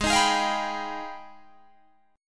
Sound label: G sharp Minor Major 13th, Guitar DADGAD; G#mM13 strummed